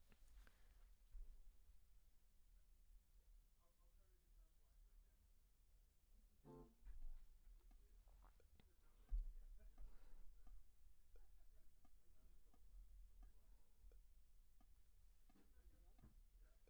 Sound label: Ld VOX SM7